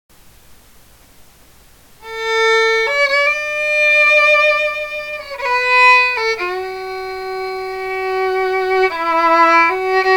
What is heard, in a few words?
Violinist, violin solo